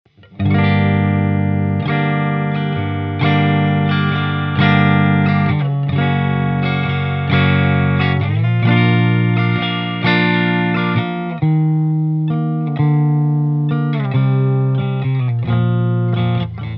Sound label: tele bridge